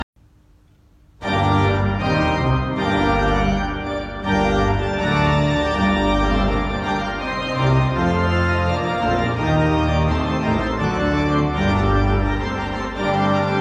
sinfonia organ transcription; Johann Sebastian Bach; from cantata bwv 142; author johann kuhnau

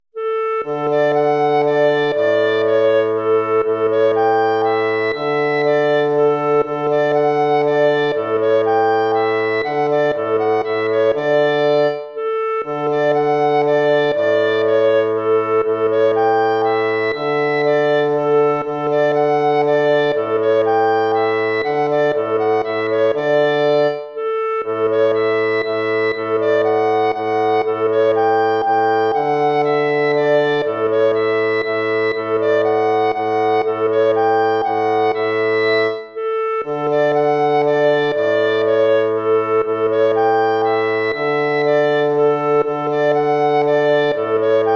waltz; Ferdinando Carulli

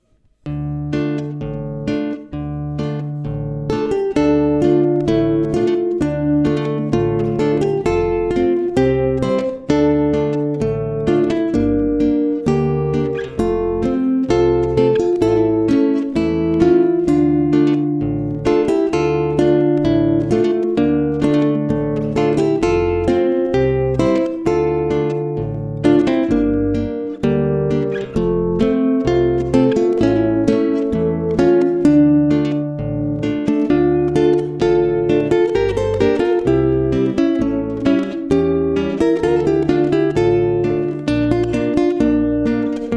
ballade

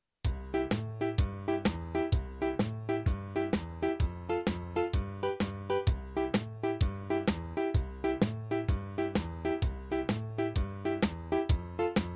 sax quartet; neska polita

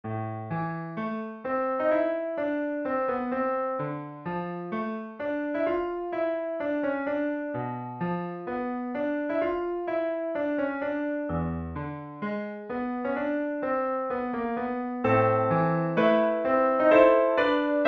preludes for piano solo

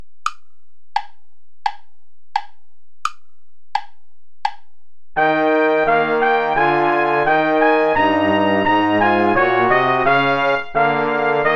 play along without trumpet

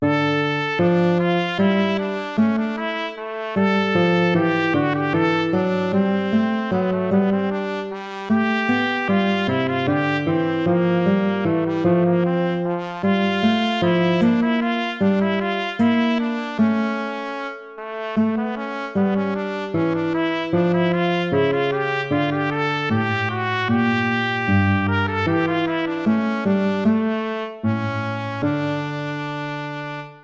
air, hornpipe

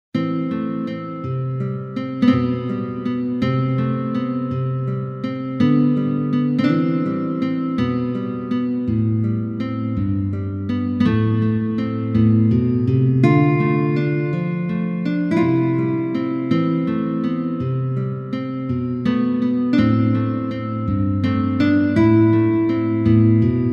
duet for classical guitar duet

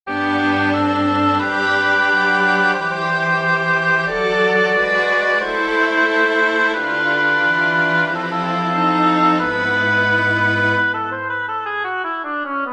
prelude for oboe strings; bach johann sebastian; allein gott der sei ehr

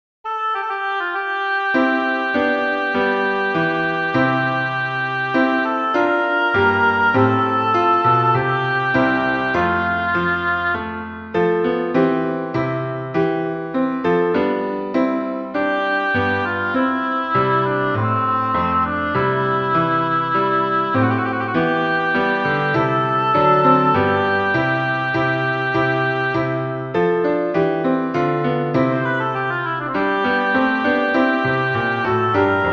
choral prelude for oboe piano; buxtehude dieterich; ist das heil uns kommen her

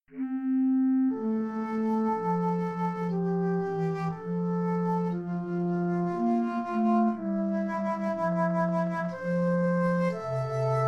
choral fugua psaume

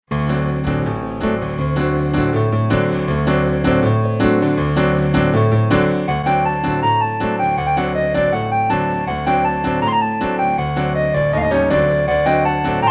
ballet music; kyra kyralina; part lautareasca

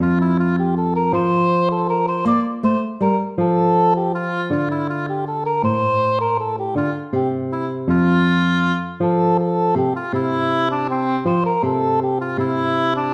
lute

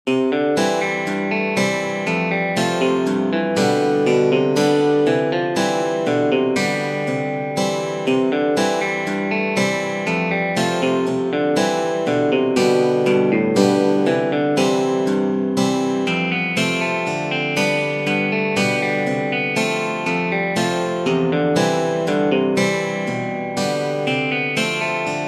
western country

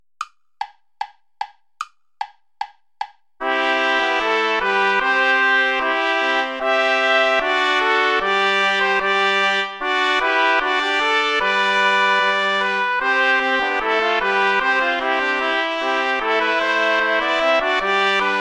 ballet play along without trumpet; praetorius michael iii

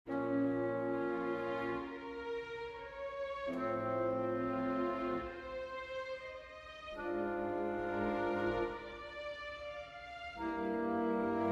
for winds strings; See with what sad dejection

